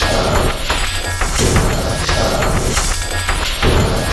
Industrial Loops; USB Soundscan vol.24; AKAI

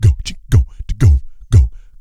GO BOY, Vocal Planet, Blues Soul; Spectrasonics

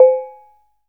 CANANG C4; Spectrasonics; Heart of Asia

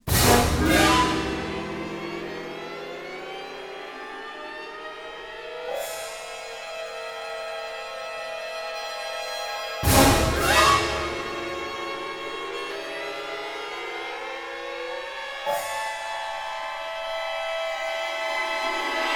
Orchestral Loops STRING ACCENT; Best Service ProSamples vol.33; AKAI

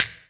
EQ Perc, Percussion Mix

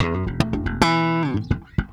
Bass Loop, Ambient Grooves

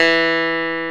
CLAVINET E3; E-MU Formula 4000 Series Vol. 3; Analog Odyssey

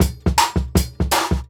Jungle Breaks; Original Jungle Breaks

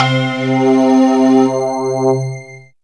Ambient Atmos, Dance Synths

